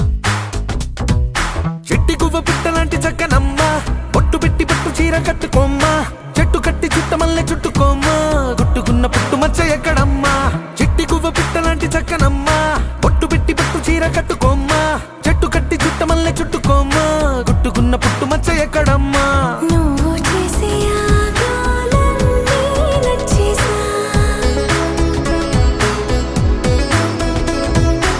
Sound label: Sitar Song; telugu love ringtone